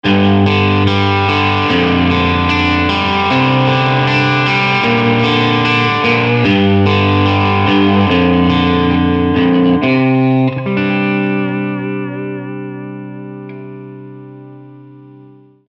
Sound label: 6L6 crunch boost